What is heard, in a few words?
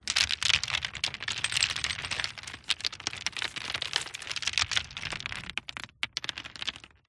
gravel rolling downhill; Sound Ex Machina